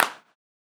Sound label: clap, Roland MV8800